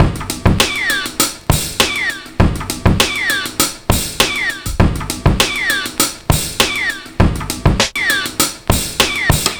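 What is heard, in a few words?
drum, 100bpm, Breakbeat; ProSamples vol.40 - Breakbeat 2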